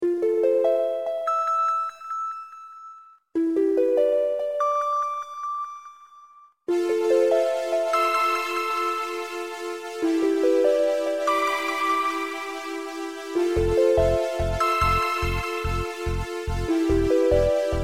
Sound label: raindrops